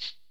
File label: perc; Super-Dirt project